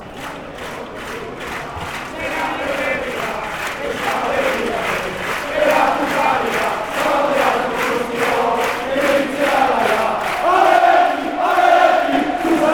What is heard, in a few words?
athletic chant